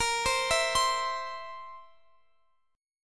A sharp Suspended 2nd Flat 5th A sharp, Guitar, Drop D